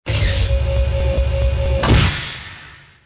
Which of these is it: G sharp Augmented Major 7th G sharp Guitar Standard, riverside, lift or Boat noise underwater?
lift